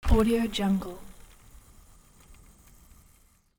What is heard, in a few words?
fishing rod throw; alexm76